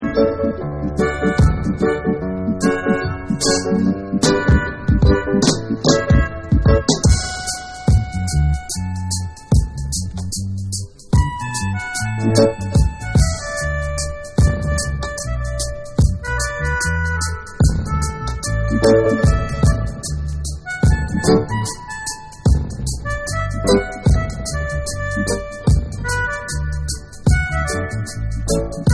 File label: DUB